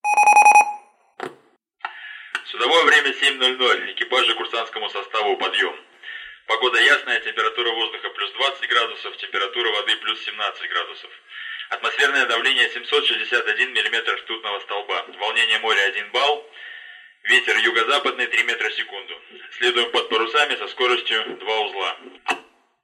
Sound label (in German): Wecken